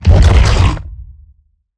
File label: Roll Surface Start; Evolve; Behemoth